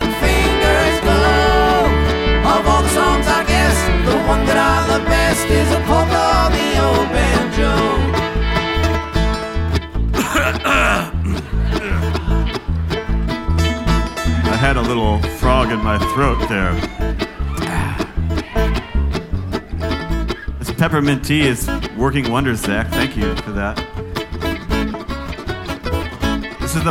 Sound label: Polka On A Banjo